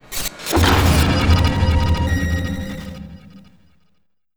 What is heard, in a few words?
text glitch